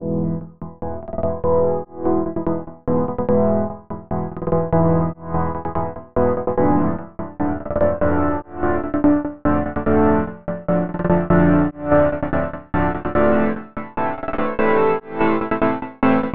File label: chord loop 146 E random gate